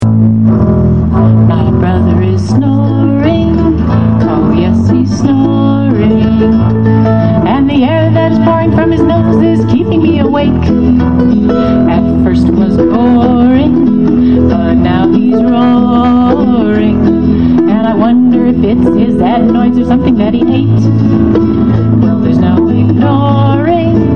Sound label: snoring